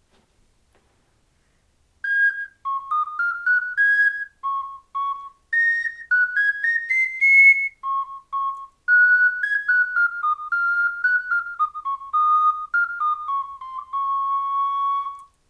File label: ocarina